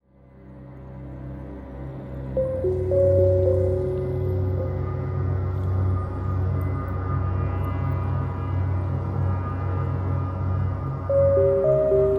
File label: Mallet Cello